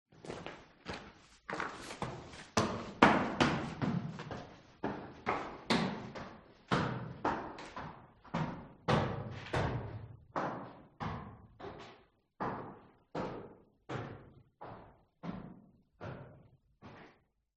foley footsteps walking up stone stairs away int cave natural reverb boots